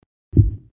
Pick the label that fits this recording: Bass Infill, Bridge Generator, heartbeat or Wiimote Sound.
heartbeat